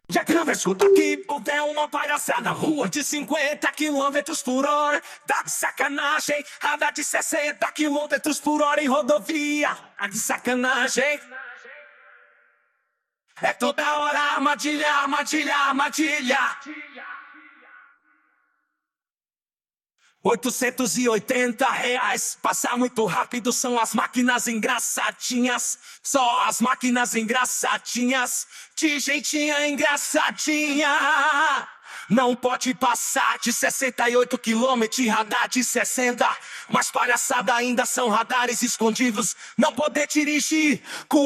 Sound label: Vocals, Piseiro